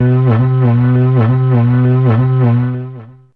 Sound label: Digdoo C4, Fairlight CMI IIe Wind